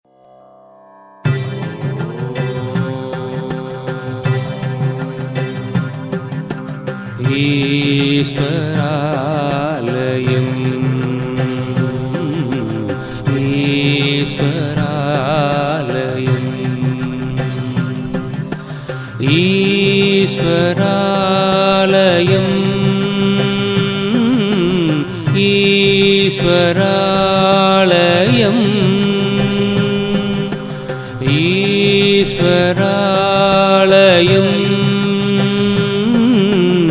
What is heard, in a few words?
chanting